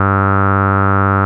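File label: OB8 organ C1, OBERHEIM OB8; Big Fish Audio - First Call Vintage Keyboards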